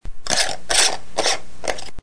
abrir frasco

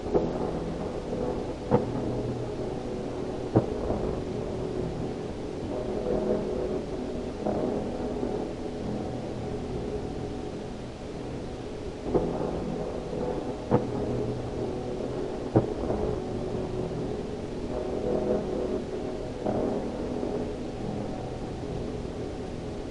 distante

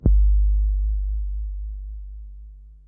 tambor

